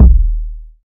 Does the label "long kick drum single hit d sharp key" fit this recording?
Yes